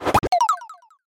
Coin Box Equip

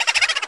Boo Laugh

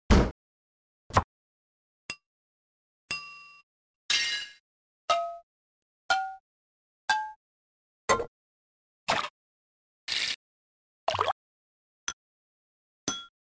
kitchen Drums Instruments

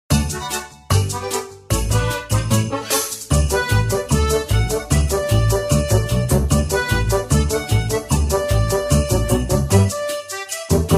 Snow Land Theme

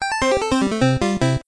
Game Over Theme; SMB2

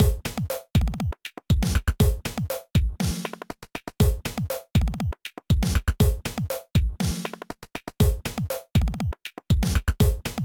Petit Block, Microgame music

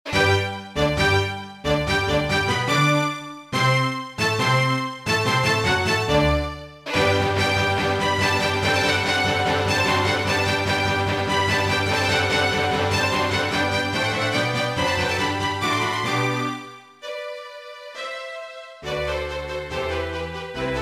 Figure Skating

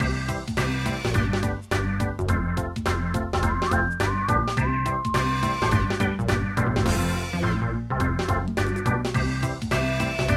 Dribble Spitz Theme

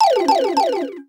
Power Down sound effect; SM3DW

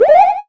Sound effect of Tail Attack; NSMB2

